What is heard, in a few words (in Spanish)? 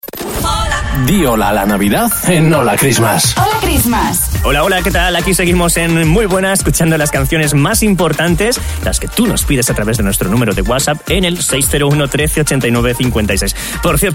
Saludo Cancion CUMPLEANOS FELIZ